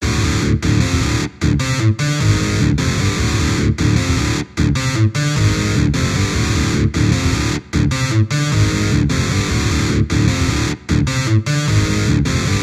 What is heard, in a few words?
Screamer Recti Eq Reverb